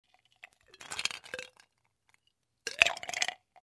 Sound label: Filling Ice Cubes in Cup